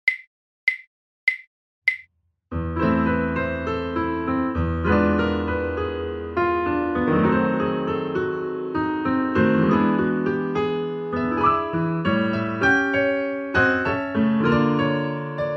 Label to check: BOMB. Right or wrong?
Wrong